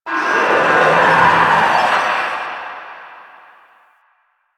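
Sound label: Infested Distant; Warframe; NineteenNinetyNine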